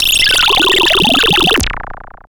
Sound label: FX, attacks